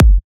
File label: Punchy Kick